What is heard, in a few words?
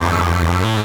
TECHNO EXTRA 140